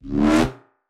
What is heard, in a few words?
Danger Tile Prespin